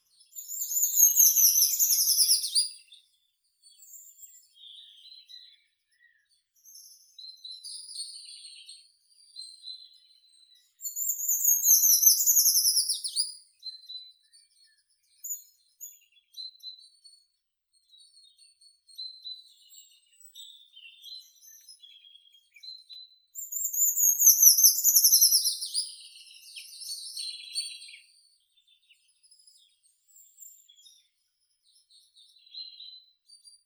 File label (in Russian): pishuha obiknovennaya certhia familiaris; zvuki prirodi